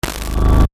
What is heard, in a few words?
Distortion Glitch